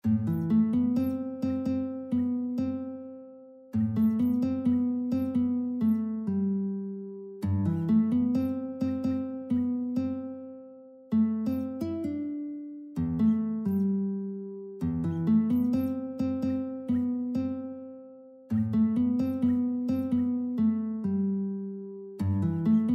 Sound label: Classic Guitar